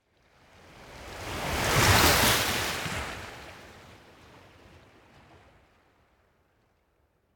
Ocean Surf Water Lap On The Coast Surf Medium Faster Waves; Hollywood Edge; sound designer's toolkit v2